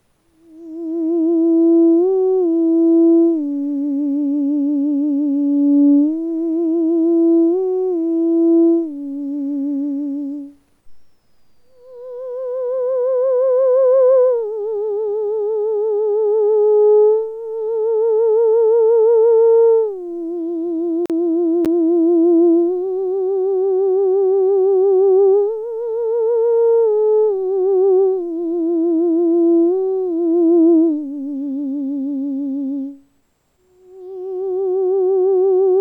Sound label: theremin